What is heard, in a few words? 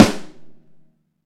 Ludwig Snare, EMU PX-7 Drum Machines